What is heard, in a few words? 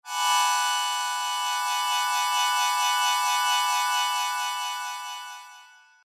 Sho